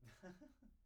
laughter; IEEE AASP

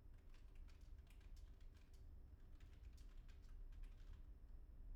keyboard; IEEE AASP

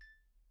alert; IEEE AASP

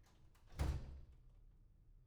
door slam; IEEE AASP

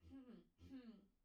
clear throat; IEEE AASP

